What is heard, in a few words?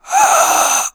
death sound basic